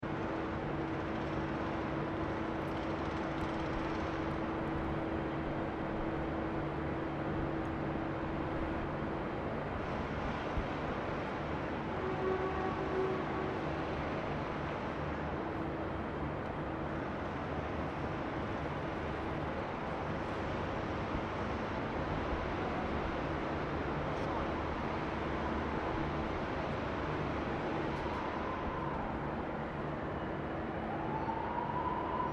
Ambience Melbourne Southern Cross Station, Southern Cross train station Melbourne; City; Cities